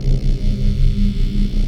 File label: Scifi Hover Sentry Loop; SFX Kit; Sci-Fi Loops